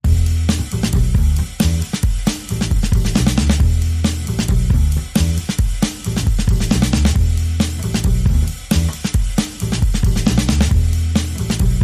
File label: Bass and Perc Only